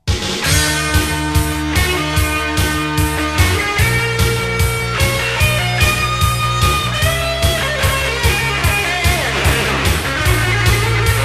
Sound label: GUITAR SOLO